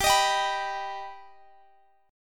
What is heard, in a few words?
G Augmented G Guitar Standard; strummed; capo 9